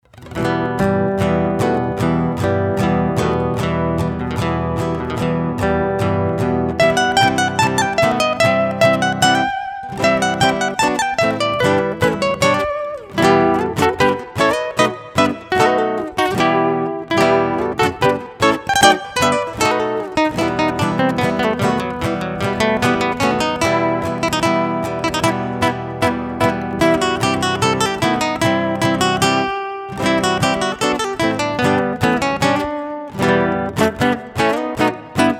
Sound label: intro guitar